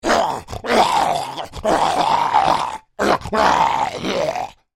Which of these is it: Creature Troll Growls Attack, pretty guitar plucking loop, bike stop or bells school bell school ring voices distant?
Creature Troll Growls Attack